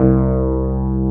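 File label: Wurlitzer C2 - L; USB Soundscan vol.09; AKAI; Keyboards Old School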